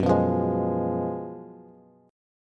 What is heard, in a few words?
Gb Major 7th Suspended 2nd Suspended 4th, down, Bass; strummed; JGuitar